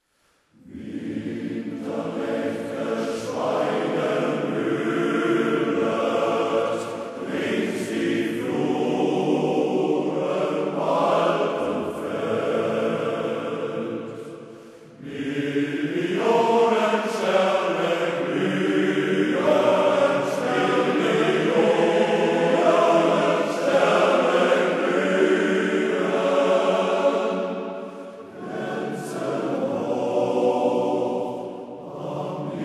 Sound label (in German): Weihnachtsglocken